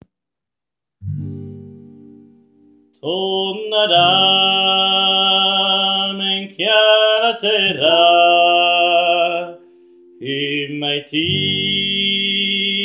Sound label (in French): bass, chorale; tornaram